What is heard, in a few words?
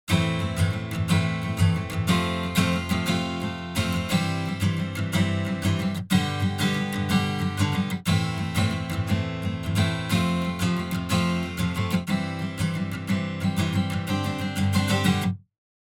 Strumming Guitar - Dry Space; Spatifier